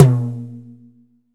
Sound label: TOM, Drum Dry; East Collexion; Drum 1